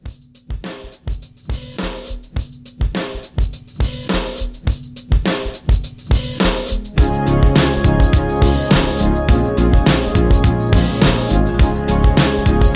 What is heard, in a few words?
Christmas Re Drum 104